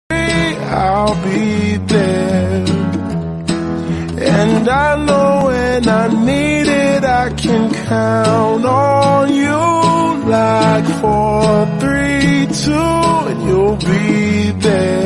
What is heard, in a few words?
slowed reverb ringtone